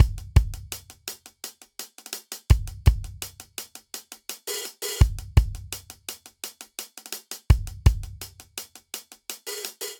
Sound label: Straight Seven Rock Breakdown 7/8 84 bpm; Ableton; Factory Packs; Drum Booth